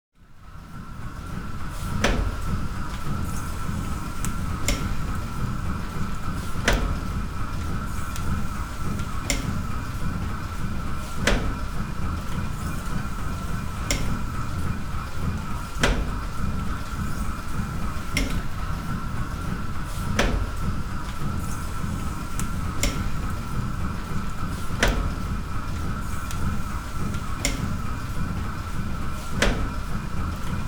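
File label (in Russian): Паровой двигатель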